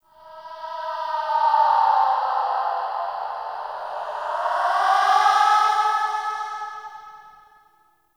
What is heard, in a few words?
Spirit Cry, Crypt Ambience; One Offs; Champion Crypt